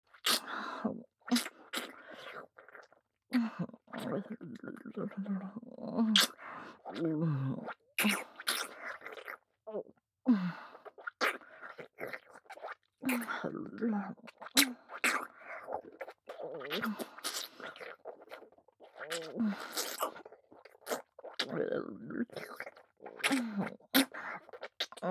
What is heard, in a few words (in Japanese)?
ディープキス; ヒルダ